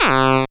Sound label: Dance Synths, Acid Bubble, c